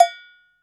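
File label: cowbell small, drum machines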